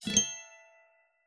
Ac Gtr FX, headstock, slow stroke, soft, strings, bright, airy, ringing, tonal, E; Eiravaein Works; 48Kilos